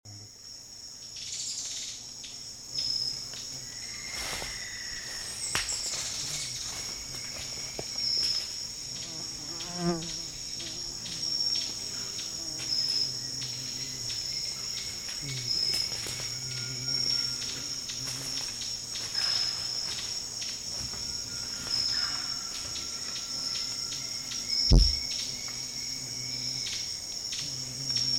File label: Fiery-topaz, پرنده زبرجدی آتشین; صدای پرنده زبرجدی آتشین